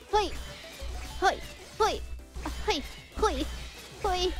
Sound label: hoi